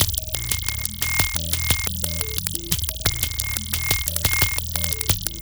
TWEAKED MACHINE, ARPEGGIATOR DRUM MACHINE; Sonic Foundry (Sony Creative Software); Vir Unis Infusion

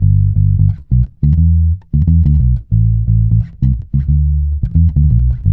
G, dub, bass; Spectrasonics; Bass Legends CD 1; JOY DUB

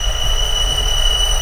ANG.V B5 HI, ANGELIC STR; AKAI S6000 CD-ROM - Volume 1; Strings

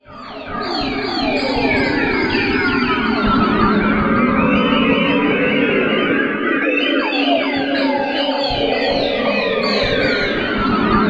SP ARP, SYNTH FX; Zero G; Malice In Wonderland

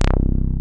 BASEMENT BASS; Masterbits; Dance Trax

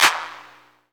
CLAP HOU, Dance Mega Drums; Best Service

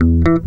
CLAV BEL; USB Soundscan vol.32; Roots Disco; AKAI

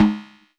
Snares, Elektron MachineDrum SPS1 MKII

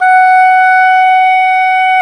oboe, Oboe Brth

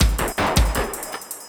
Drum & Bass, 160 BPM

